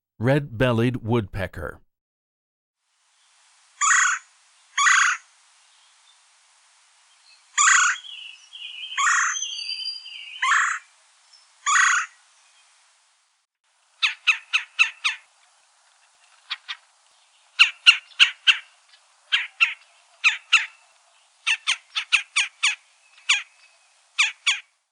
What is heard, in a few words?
Red-Bellied Woodpecker; Non-Game Birds